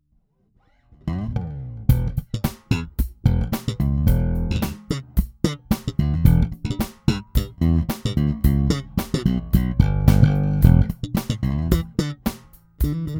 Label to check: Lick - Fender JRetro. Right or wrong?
Right